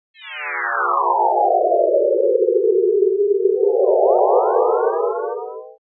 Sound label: line 2 sine